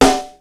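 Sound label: snare br rim, Yamaha Motif ES drum machine; 300 Drum Machines; Yamaha Motif ES-Series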